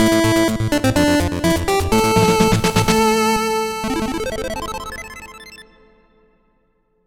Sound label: Item Pickup